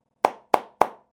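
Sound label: hand clap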